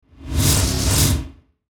Whoosh Transition, Whoosh Transition Reverse Suction Metallic; Blastwave